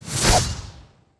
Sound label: spear skill